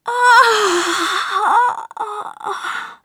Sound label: death